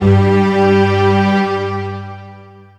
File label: Big strings G2